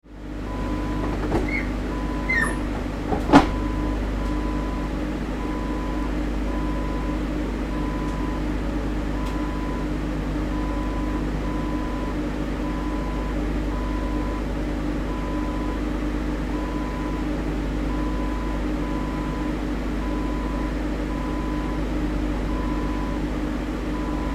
Industrial Chiller Fridge

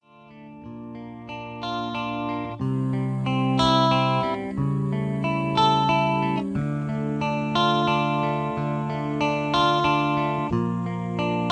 Hallelujah Instrumental

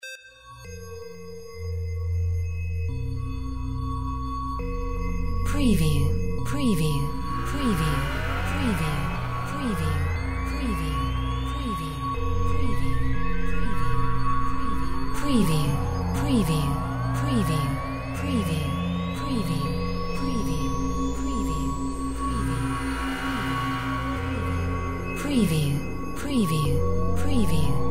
drone matrix random fx

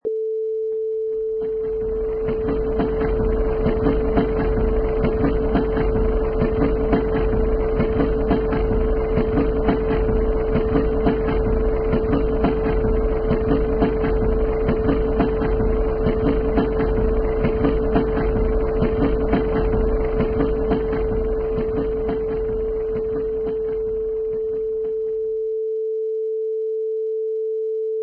Train Passing

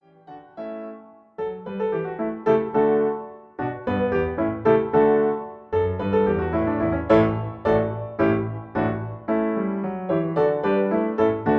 Accompaniment G; Mio caro bene

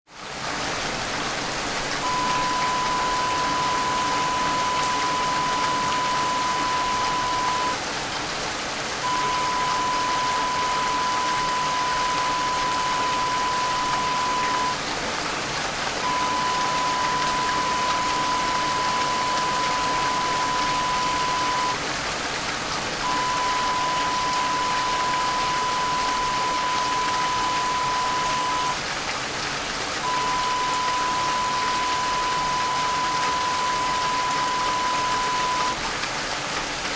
rain

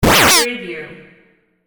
button

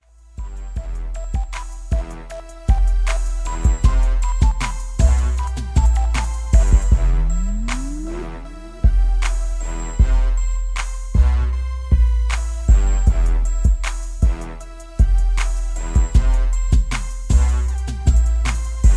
78BPM

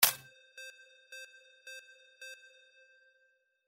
ROLLOVER REV; windy beach audio

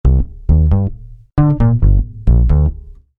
Bass

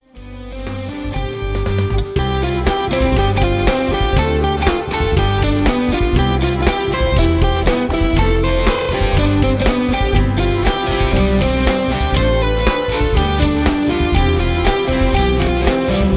Guitar Solo

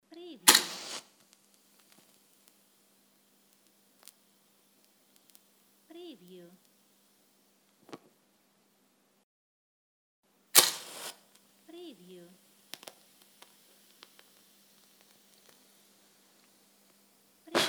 Strike Lucifer Match

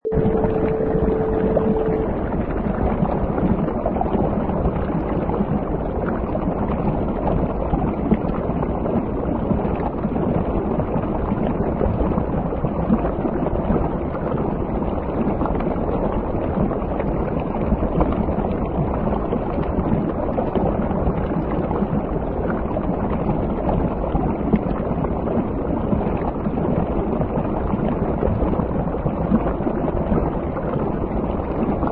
Underwater

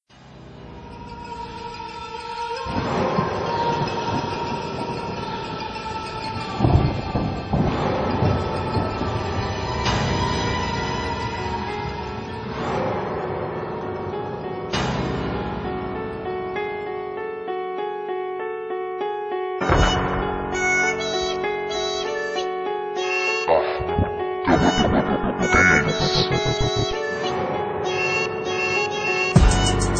Hard Dark Creepy Choir Rap Beat; in FL9

